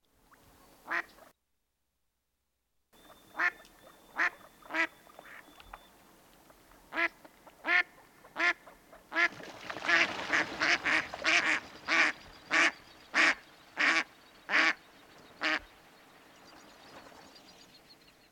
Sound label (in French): canard noir, chant